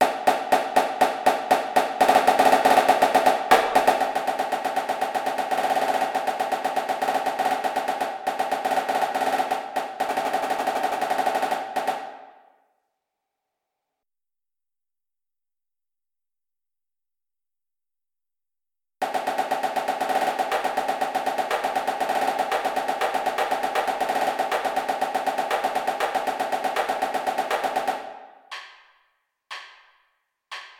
cadence eighty eight snare, drumline

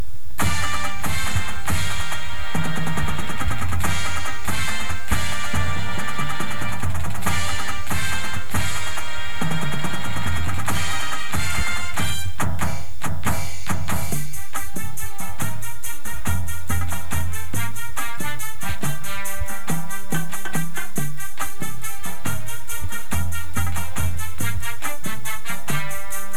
full drumline with music

